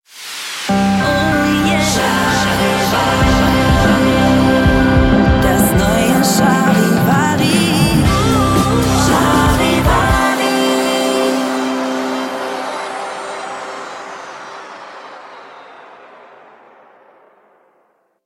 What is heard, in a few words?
Transition Med to Hot Relaxed Pop Rock; Charivari